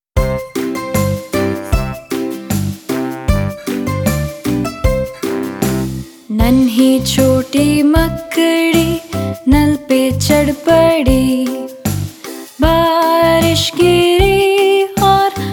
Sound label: Hindi Rhymes